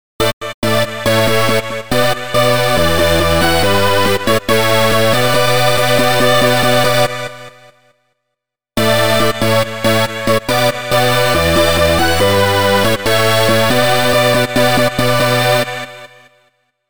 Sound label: Trance lead; SPIDoff